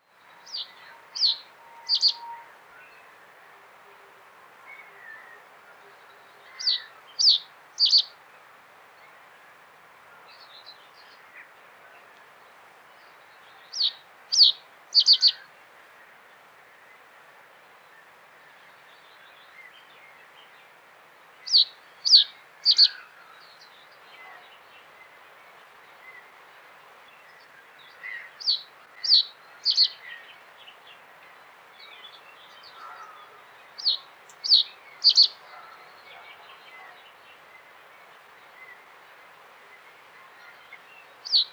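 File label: reed bunting voice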